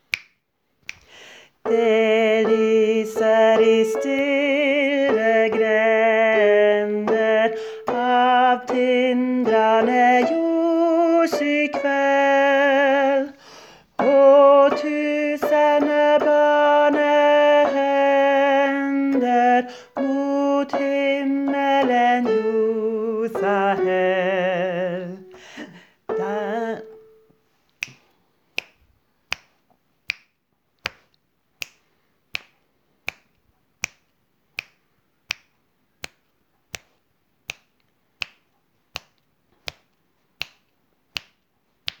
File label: Tenor